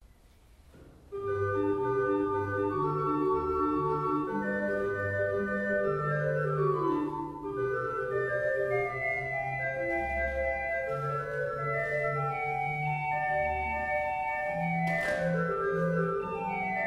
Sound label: pipe organs; Hillebrand